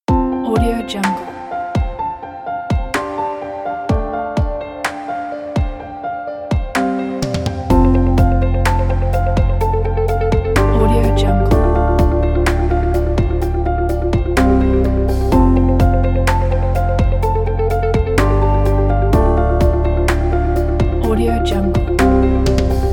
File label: for inspirational videos; mikekoylo